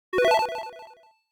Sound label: insert credit